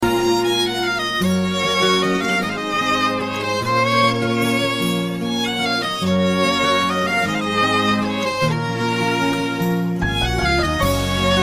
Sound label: Romantic Skripka